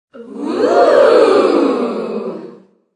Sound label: Nokia 3152